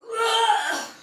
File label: Death Soldier Female, Battle Barks; Dialog; Sinecure